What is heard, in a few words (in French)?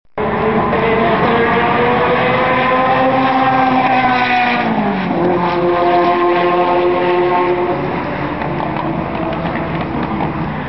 Ferrari F40 passage